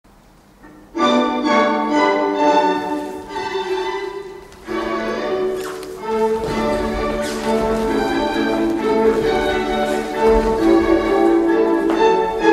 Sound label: Minuet Quick